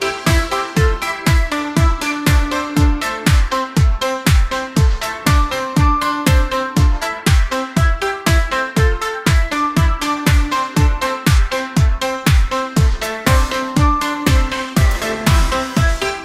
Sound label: music for a dance pop